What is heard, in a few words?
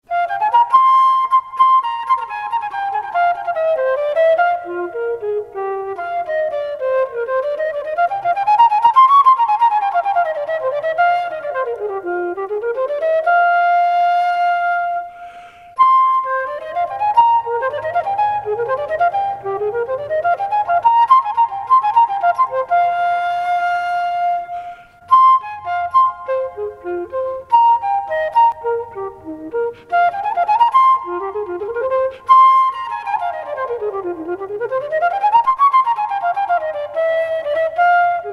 Rafi tenor, baroque flute